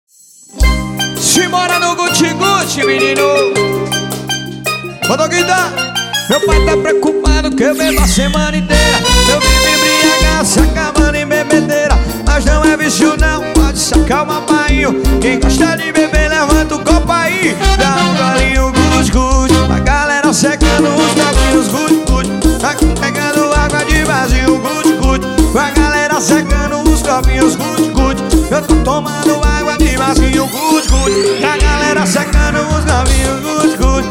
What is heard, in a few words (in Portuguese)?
forró balada